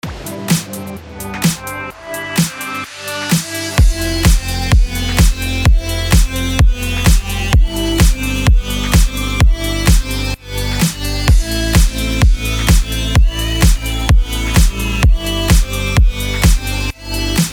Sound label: BED ELECTRO FMIN 128BPM